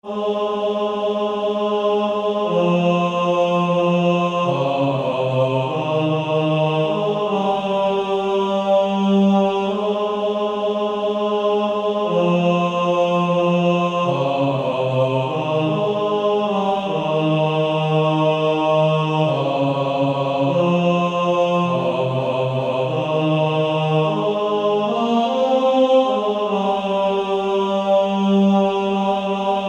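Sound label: Male Choir, bass 1; Only a step to Jesus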